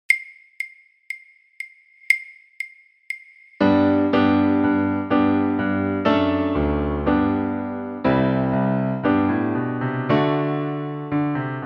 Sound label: Prelude; Charpentier; Aus der musikalischen Schatzkiste 1 - Violoncello